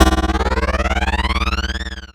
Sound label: HITS, Special FX Twisted; USB Soundscan; AKAI